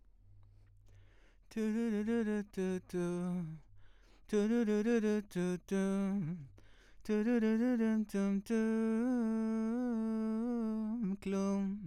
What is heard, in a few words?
VOX